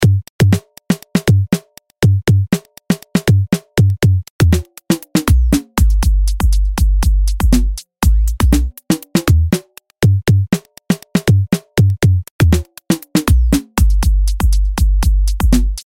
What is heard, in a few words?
drum